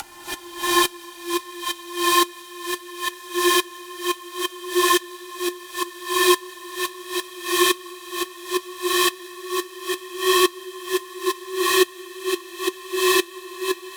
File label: Riser; neuro; InsideInfo